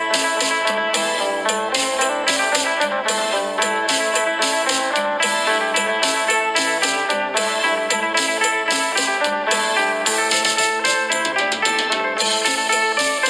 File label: Thai lao flute